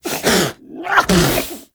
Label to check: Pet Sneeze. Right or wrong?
Right